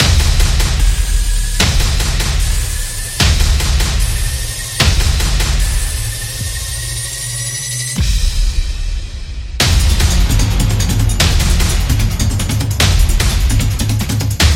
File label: Epic Sport Trailer